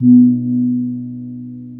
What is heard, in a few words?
KEMPUL, Bronze Gamelan